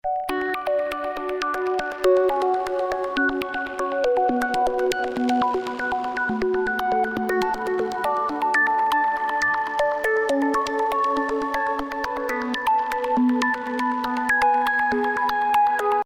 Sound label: Synths